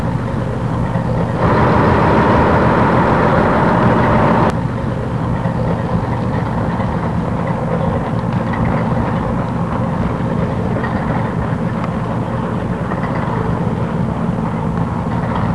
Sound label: idling push pull C420 horn; FA; Mineola; lirrhorns